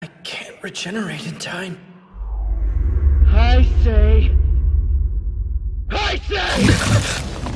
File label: Screaming; Haise